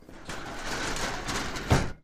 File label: slidingdoor open